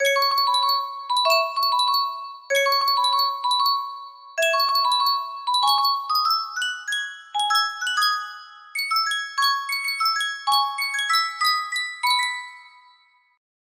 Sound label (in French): yunsheng boite a musique